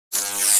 Electric dis hum

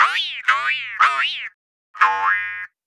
Boing spring series

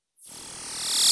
Laser effector

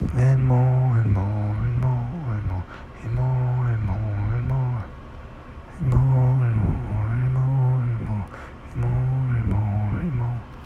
chorus

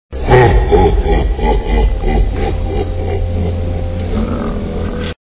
laugh; Star Wars; jabba

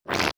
Slime gun

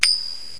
coin flip

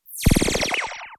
Flash laser

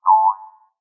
Boing spring

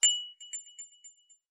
Crystal

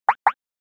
Boink double high

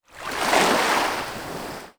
Ocean wave

